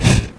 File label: bellow; Crazy Machines; Играет вся семья